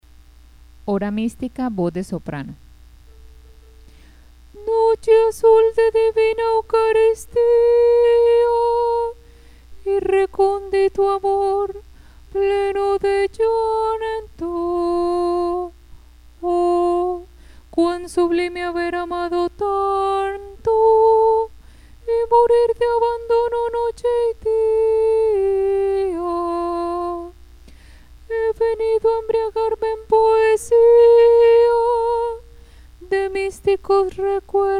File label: Soprano